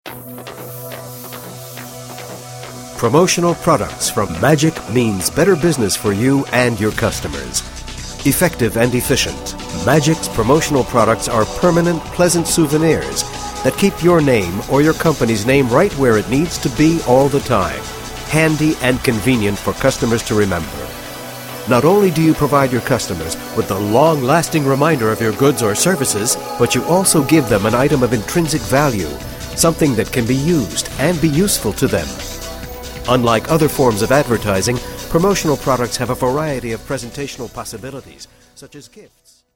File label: onhold